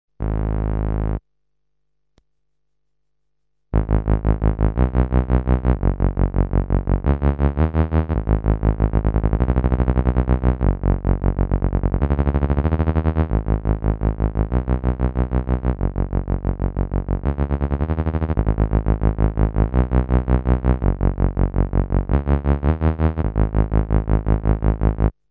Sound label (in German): Behringer Model D mit DelayDude Pedals Tremolo